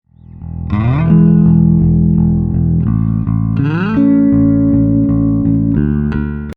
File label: bass